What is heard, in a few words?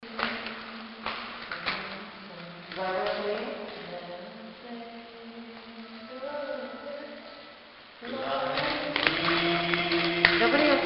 harmonica; Henryton